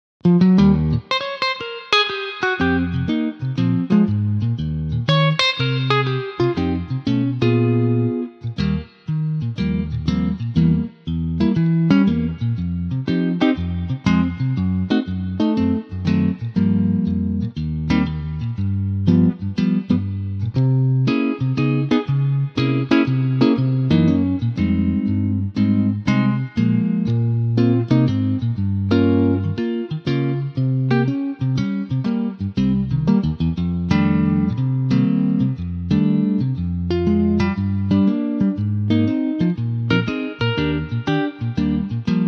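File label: Eb electric guitar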